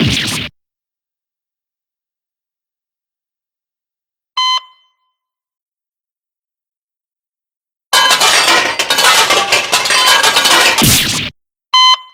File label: breakdown; Pierce